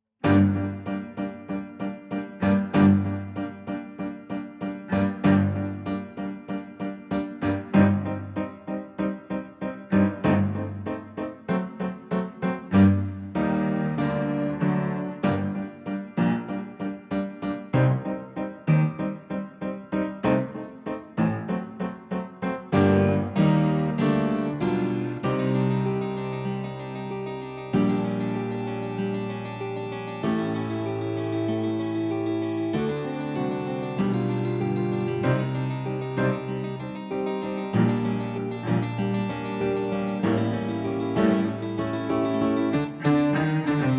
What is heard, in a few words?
A Perfect Crime Underscore, Classical Cinematic; Narrative Mind